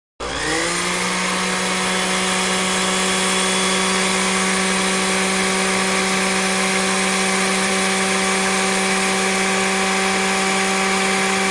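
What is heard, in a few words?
Blender; Blender -various Speeds; Low, Medi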